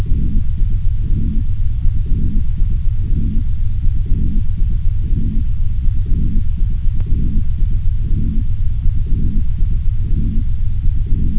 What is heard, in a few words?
beat